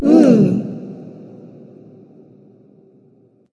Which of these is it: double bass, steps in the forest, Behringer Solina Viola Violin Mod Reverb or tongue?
tongue